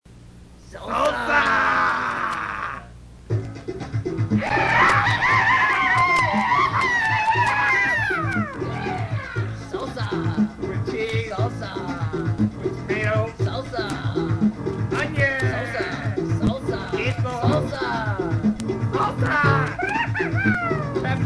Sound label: salsa